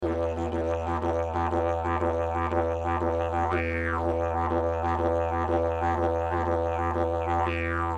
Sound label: didgeridoo traditional straight rhythmic drone loop